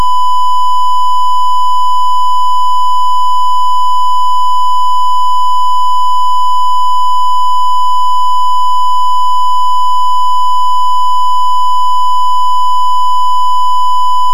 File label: triangle